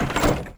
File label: digital dirt door lock gate; lo-fi digital 8-bit glitch fx; nova sound